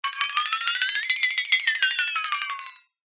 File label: cartoon flexatone wobble shake unsteady tone; zapsplat